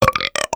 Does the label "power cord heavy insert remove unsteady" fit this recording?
No